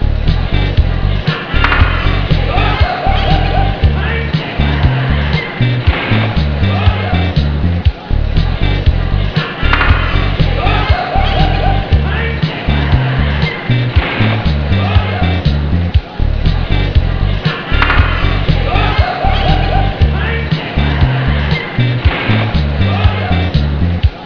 drips, ambience; svencoop; cracklife

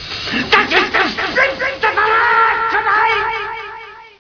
x pain; svencoop; cracklife

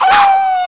wolfos slash; zelda

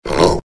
pit drone hunt; svencoop; legends

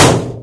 metal, debris; mistake coop

